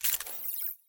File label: activate, Goggles